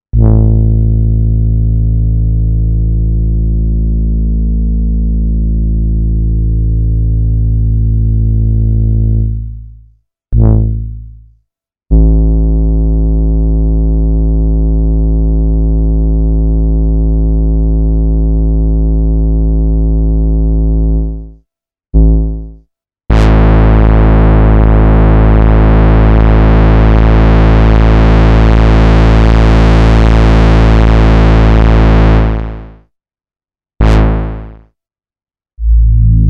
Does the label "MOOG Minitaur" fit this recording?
Yes